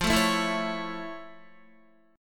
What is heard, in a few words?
F Augmented Major 7th F Guitar; F+M7; strummed